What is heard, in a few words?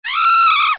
Scream; Earthworm Jim